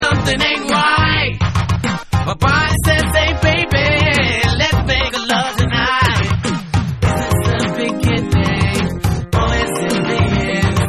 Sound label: Vocal